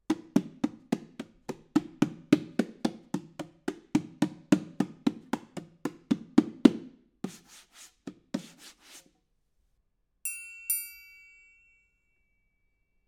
PERC; ORTF

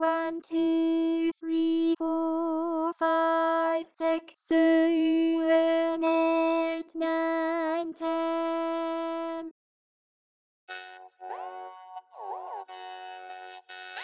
Vocoder; Sonika

